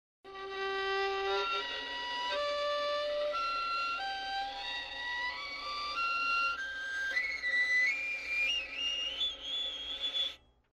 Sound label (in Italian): Armonici